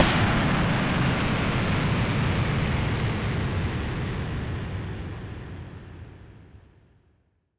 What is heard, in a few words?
Noise Bomb; musicradar; bomb factory